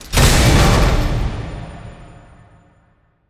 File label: case reveal mythical; Counter Strike Model Pack; CS:GO; panorama